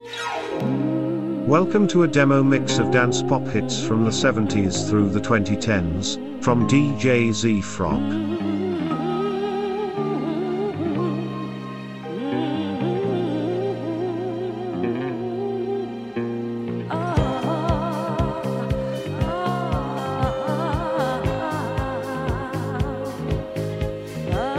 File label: Dance-pop hits from the 1970s through today